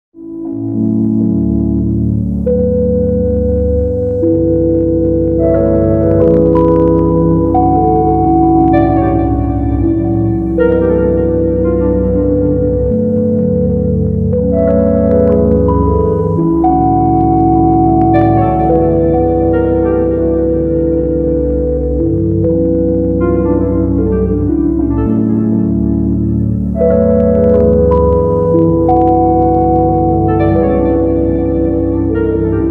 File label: Music Box Brass Locks